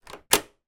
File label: household door latch lock; zapsplat